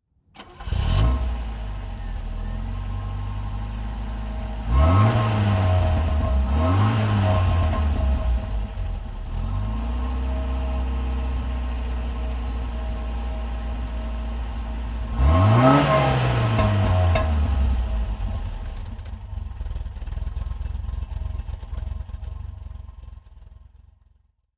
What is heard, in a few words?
Opel Manta